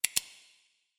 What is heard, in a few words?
Scissors